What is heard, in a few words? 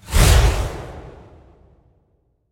birds of wisdom swing; odin; smite